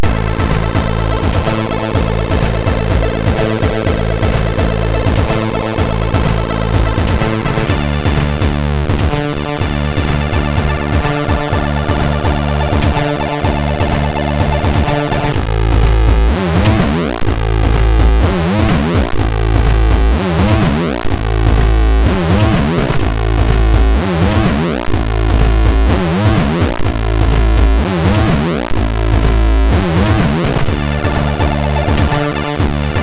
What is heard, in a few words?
Check Sound sid MOS6581R3; hvsc